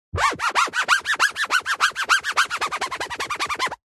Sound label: poster holographic scratch grooves with fingers whizz sound fast several